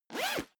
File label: zipper backpack open fast